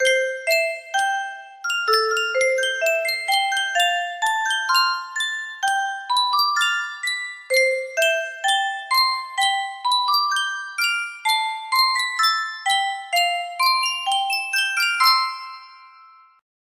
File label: Yunsheng custom tune music box